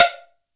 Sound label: C. BELL